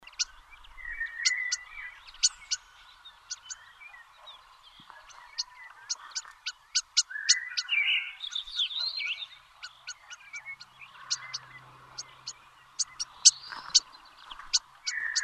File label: Common Starling Sturnus vulgaris